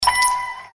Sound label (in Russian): monetka piau; soobshcheniia sms